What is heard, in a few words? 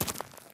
stone footstep; everness